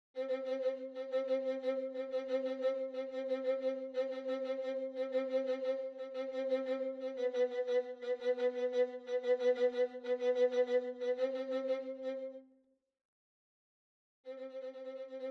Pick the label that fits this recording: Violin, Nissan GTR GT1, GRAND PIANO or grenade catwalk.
Violin